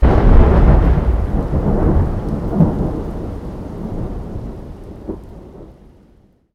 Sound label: Lightning